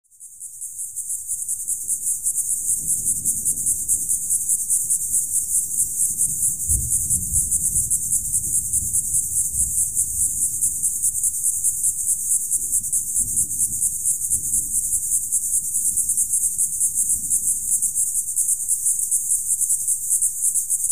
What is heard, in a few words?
Steady Cicada Chirps, desert